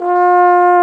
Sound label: BRS BSBONE, Bs.Bone Solo; Roland LCDP06 Brass Sections; Bs.Trombones